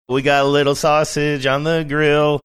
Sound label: singing we got little sausage on grill